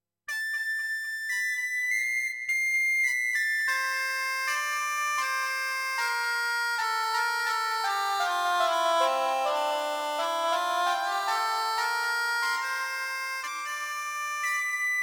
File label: Z1 Samples lead string overblown medieval